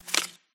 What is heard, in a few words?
card flip